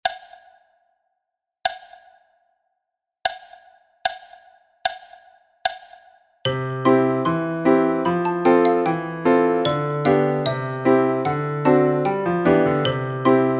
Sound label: 75 bpm, percu